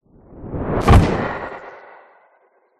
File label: sound design whoosh airy into impact electricity; zapsplat